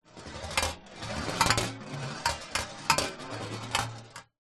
industrial metal ball bearing roll on metal plate surface